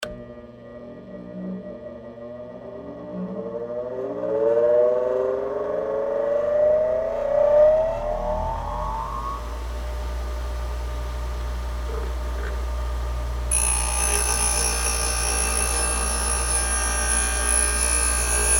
Grinder Into Steel, Industry, Grinder, Into, Anodized, Steel, Pipe, Long, Sequence; Grinder Sound Effects; BlastWave